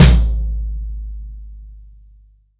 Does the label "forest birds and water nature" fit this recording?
No